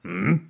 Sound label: squash hmm